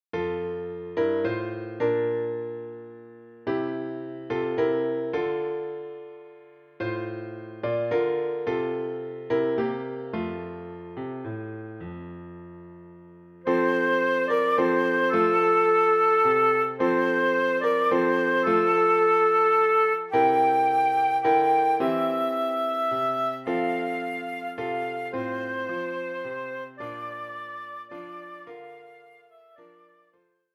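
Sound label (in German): Stille Nacht heilige Nacht Querflöte, mit Begleitung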